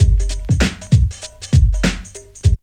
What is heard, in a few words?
break; Zero G - Man Machine